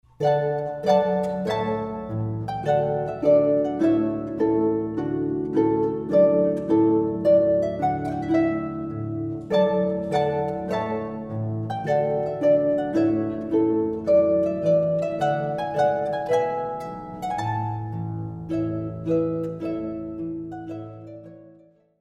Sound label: Trumpet Tune; Purcell